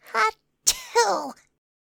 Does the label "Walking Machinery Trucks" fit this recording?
No